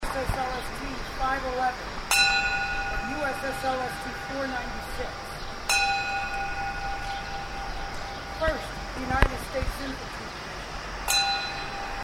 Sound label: Bells